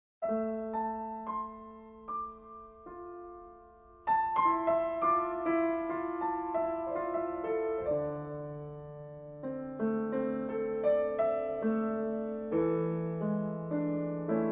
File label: Free Thoughts improvisation